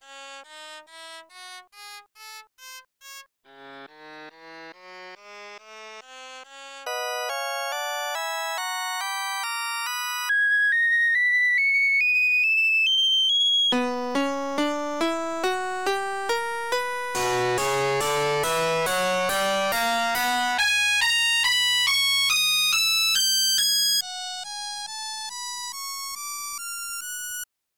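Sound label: A Flat Harmonic Minor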